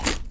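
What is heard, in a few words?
small bite hard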